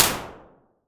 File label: narrow bumpy space